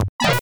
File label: Plank Breaks; monkey island 2 lechuck's revenge; ibm pc at